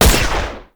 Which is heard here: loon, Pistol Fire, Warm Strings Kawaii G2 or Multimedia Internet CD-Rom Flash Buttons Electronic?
Pistol Fire